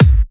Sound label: Bassdrums